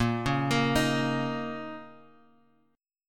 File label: A sharp Minor Double Flat 5th, A sharp, down, Guitar, Open F; A#mbb5 arpeggiated; JGuitar